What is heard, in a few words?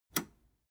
metal button robust release enable